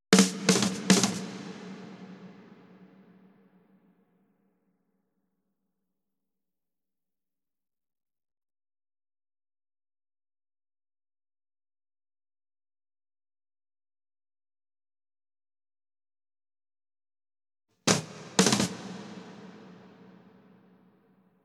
drums

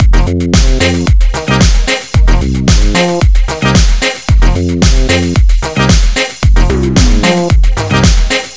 F 112, Discostep Combi Loops; Sunshine2

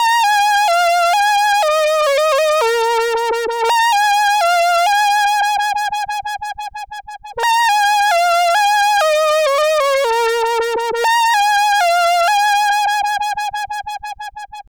Synth Lead; Synths and Organ

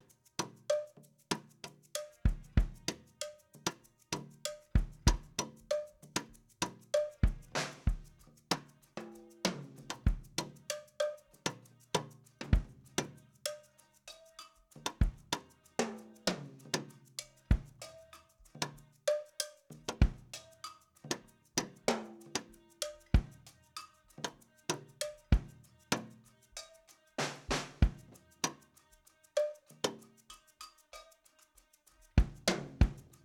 Kit Ending Section

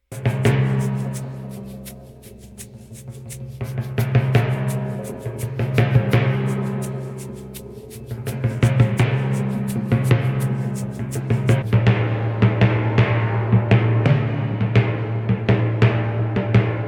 drums

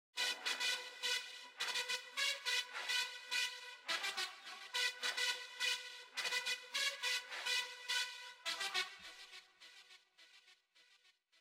Synth; Remix Sample Pack

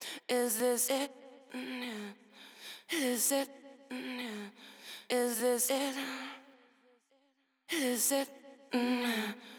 Effected Vocals Bridge; Multitrack

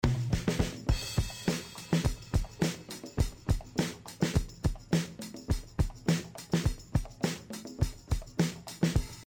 Percussion

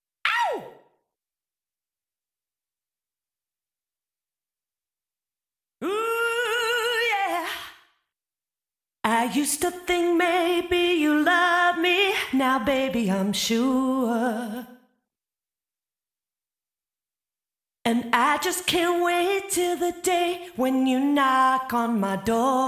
vocals

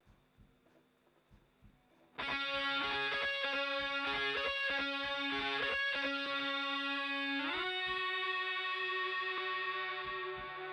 Guitar Bridge Chorus

